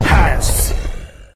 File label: skill breath